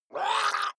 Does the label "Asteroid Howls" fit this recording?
No